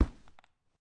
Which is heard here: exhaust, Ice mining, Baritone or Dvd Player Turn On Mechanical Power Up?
Ice mining